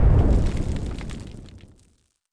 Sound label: new artillery; World of Tanks Blitz; ambient neptune